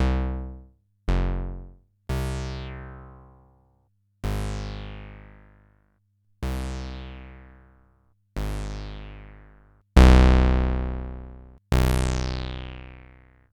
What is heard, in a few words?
MC202 Bass